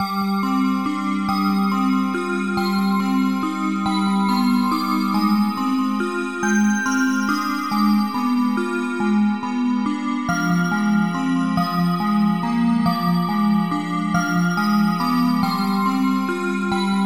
Sad Theme Game Loop

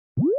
Gliding Beep Short

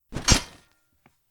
Axe Impact

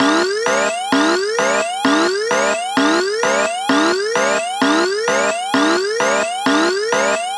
Siren Alert

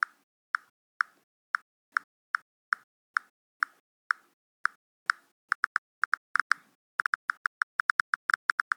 Smartphone Typing

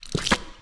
Wet Impact

